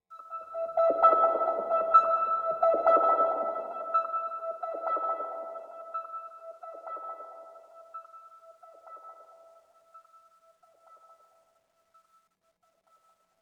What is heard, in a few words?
One shot Synth; zombie army 4 dead war ps4 stadia switch windows xbox one gamerip 2020